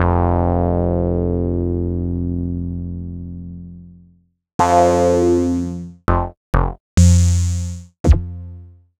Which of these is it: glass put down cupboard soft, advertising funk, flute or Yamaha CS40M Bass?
Yamaha CS40M Bass